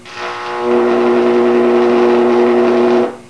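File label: Microwave Oven Transformer arc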